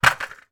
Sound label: game barrel put down on carpeted floor; pop up pirate